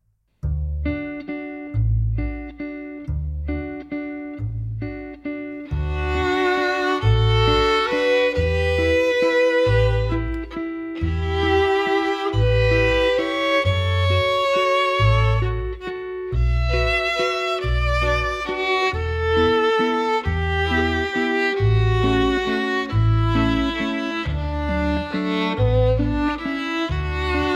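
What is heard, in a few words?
Waltz, cello; Skaters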